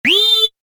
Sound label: Electronic Alarm Loop; Alarm Sound Effects; Alarms (Sound Effects:Electronics); Stuart Duffield